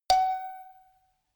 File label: notification kalimba rattle high